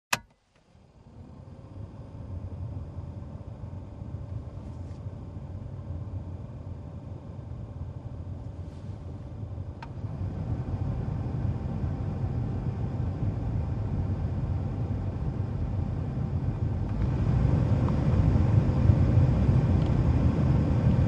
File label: Mitsubishi Montero SUV, heater fan, four speeds & off; VEHICLES MITSUBISHI MONTERO: INT